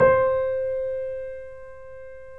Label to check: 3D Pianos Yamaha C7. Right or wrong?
Right